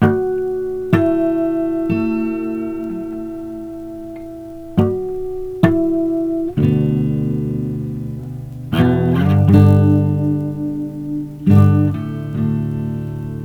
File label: harmonics